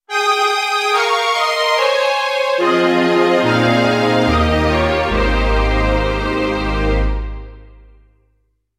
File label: gentle, jingle